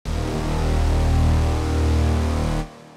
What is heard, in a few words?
Dramatic Brass